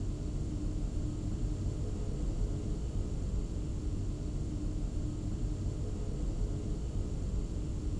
lab ambient; CrossCode

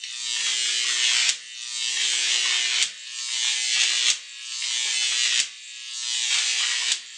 electricity continuous; The Swapper

